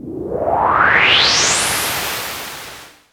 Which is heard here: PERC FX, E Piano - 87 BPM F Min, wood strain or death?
PERC FX